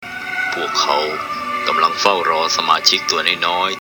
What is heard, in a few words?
cartoon